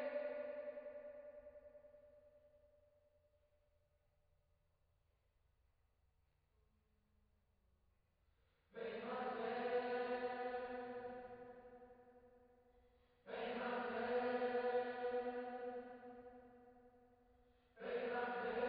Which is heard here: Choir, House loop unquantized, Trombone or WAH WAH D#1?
Choir